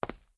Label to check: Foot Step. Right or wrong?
Right